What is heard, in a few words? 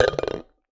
Base Wobble, Medium Wine Glass